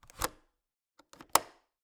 Cabin, window, latch, flip, lock, open close, wide; Eiravaein Works; Vaeyan IV